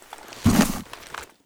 rifle mask use; STALKER; IWP; item usage

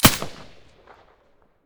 silencer; weapons; pp1901; vityaz

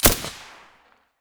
vss silencer stereo, weapons

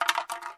energy drink; STALKER; IWP

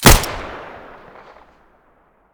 muzzle fiveseven mono, weapons

